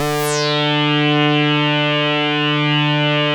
SAW RES DRY, POLYRES SAW; InVision Interactive; Keith Emerson; The Most Dangerous Synth and Organ

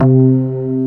SYN PERC; Sound & Vision - Gigapack I CD 2

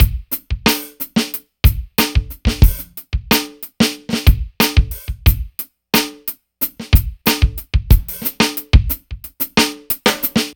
Dry Funk Kit, Drums Beats

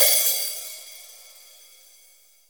HH, Drums, AMB KIT; Real Mega Drums

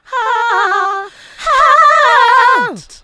AHAAHA, Funky Vocals, SHOUTS; USB Soundscan vol.18

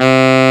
BRASS C2; AKAI S-Series CD-ROM Sound Library VOL-8

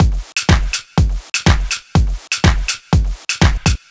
123 Minimal Funk No Hats, Drum Loops; 123bpm; Nu Dance Electro

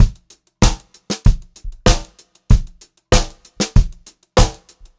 BEAT, Pop-Rock Drumloops; USB Soundscan vol.37; AKAI

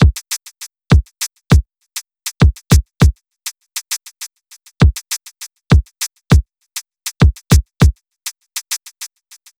BEAT, Hip-Hop & R&B; USB Soundscan vol.47; AKAI; TIMBA

